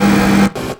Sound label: MACHINES